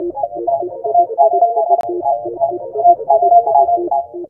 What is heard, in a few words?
127 sound 1 L, Fresh Disco House